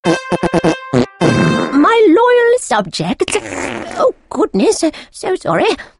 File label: royal fart trumpets